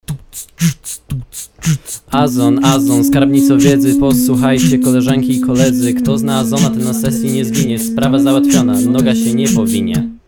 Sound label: Vocal MIX FX